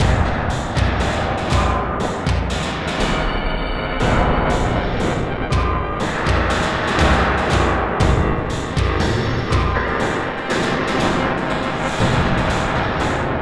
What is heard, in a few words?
Success Tonal B6; Insanely Twisted Shadow Planet